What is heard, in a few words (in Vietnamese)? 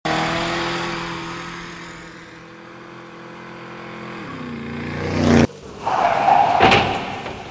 Tieng xe may tai nan